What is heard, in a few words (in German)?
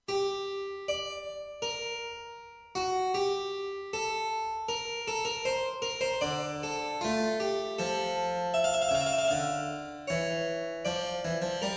Fuge g-moll Cembalo; Fuge 2